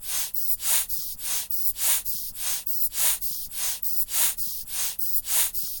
air pump; horror

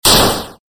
game explosion; arms

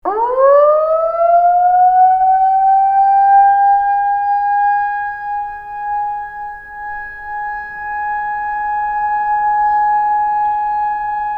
wide area siren

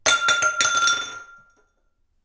empty glass roll

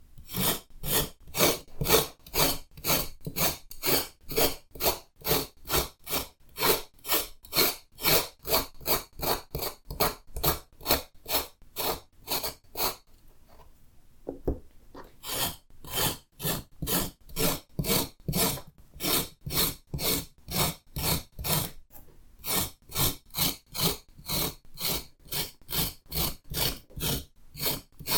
shave burdock root; cooking

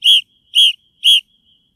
shrill whistle

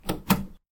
elevator button

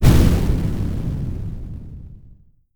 small explosion; arms

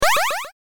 powerup

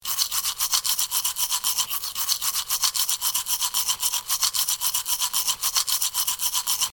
brush teeth

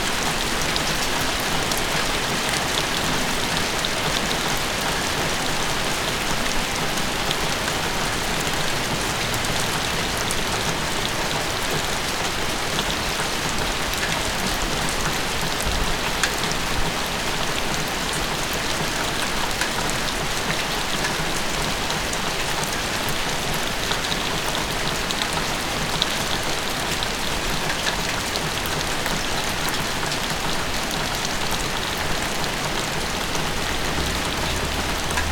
shelter from rain; 軒先の雨１

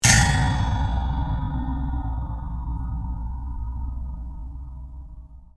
terror attack; horror

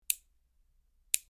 nail clippers; human

